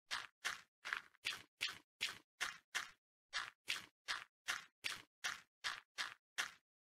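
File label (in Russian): шаги по гравию minecraft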